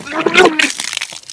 vomit

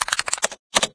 com use, buttons; sven

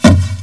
tube fire

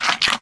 pseudodog chew

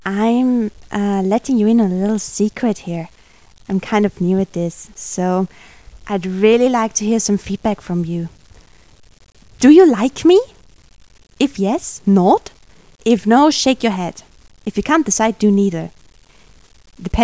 feedback

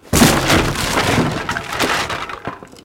break wood

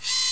deploy, portal turret

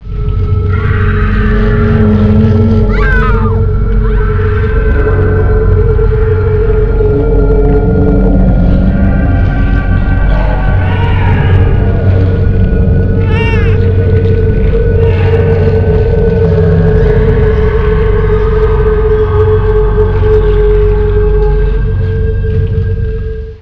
hell ambient

